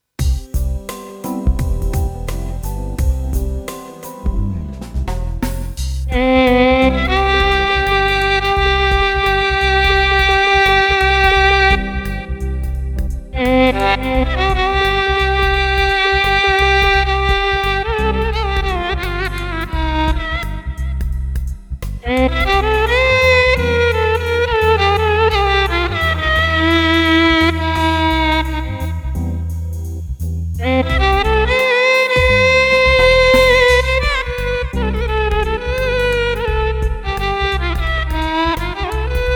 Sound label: Violin Agam C